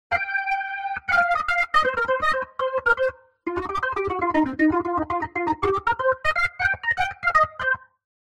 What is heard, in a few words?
Rotary Speaker Rotary Driven Organ Wet; AmpVibe; Syntheway